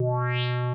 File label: fx synth